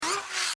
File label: Servo Mechanical SciFi Robot Toy, Scifi Servo E Mechanical Robot Small Motor Toy; BBFX